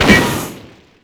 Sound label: sherman critical hit; WAR